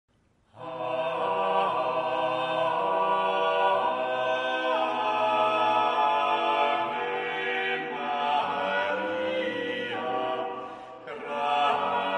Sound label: Ave Maria for SATB Choir; Igor Stravinsky